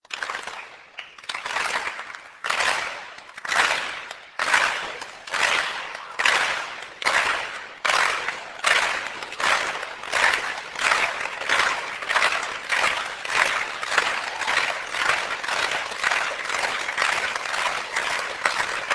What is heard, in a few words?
applause